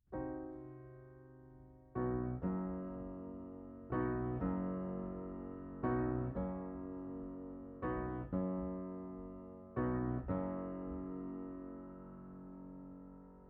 Piano Mono

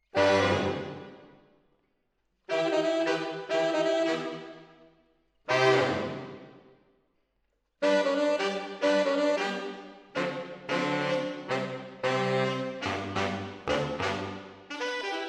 MKH AB, piano

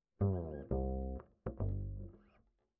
Bass Line

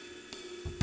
Ride

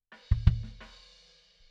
BD In

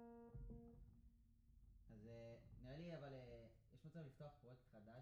Piano Mono

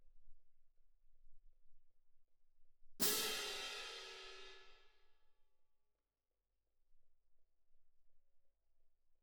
Cymb Main

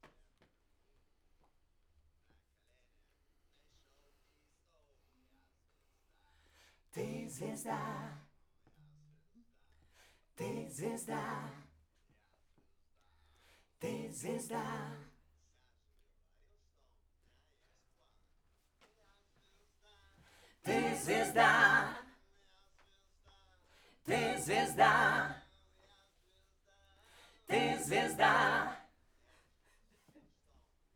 Backs SCHOEPS

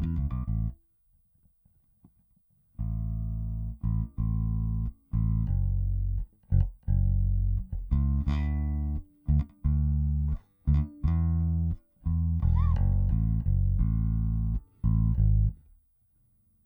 E Bass

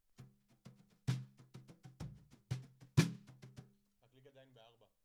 Snare Top

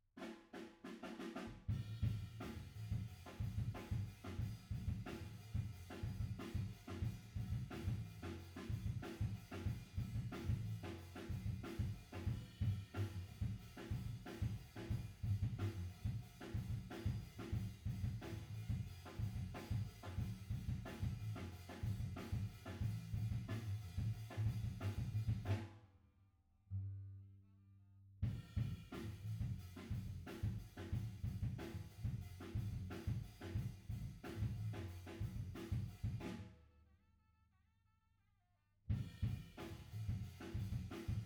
Piano B

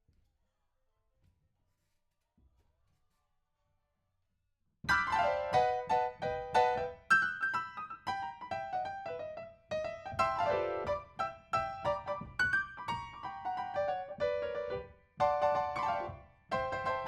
PIANO, RNR for piano

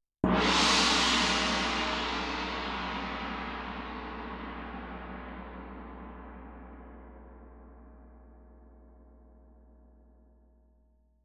Tam-Tam Close

